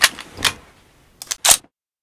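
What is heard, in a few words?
infantry weapons foley; Red Orchestra Ostfront 41-45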